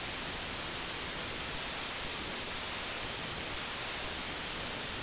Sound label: across apartment